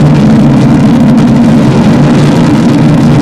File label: engine; falcon